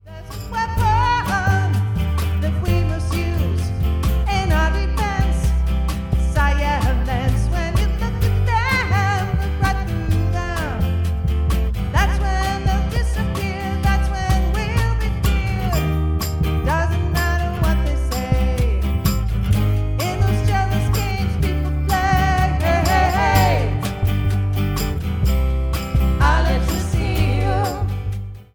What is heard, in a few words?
acoustic snippet